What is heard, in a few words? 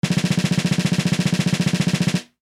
drum roll; ドラムロール; ドラムロール (1）のジャーンの部分をなくしたもの。ドラムロール（1）の長さ調整などにも